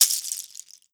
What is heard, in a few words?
Shaker Chajchas; Marleneayni